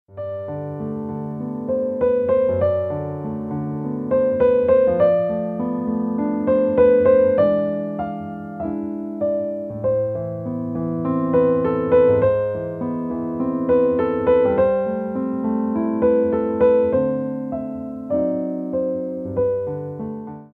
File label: piano